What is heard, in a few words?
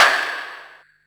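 MISC Right, Techno 138 BPM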